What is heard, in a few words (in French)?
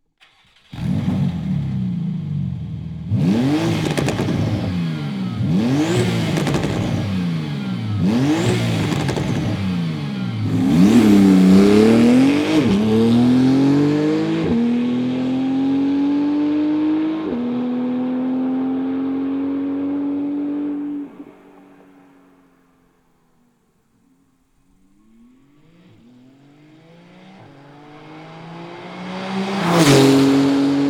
sonorite echappement sport Porsche 911 991 gt2 rs